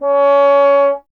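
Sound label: bass trombone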